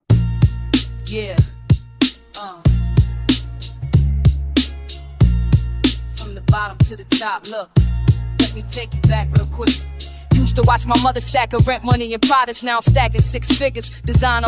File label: Rap Hooks Hip Hop Beats